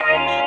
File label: Eb STAB, funky; Loopmasters; Funky Underground Vol 2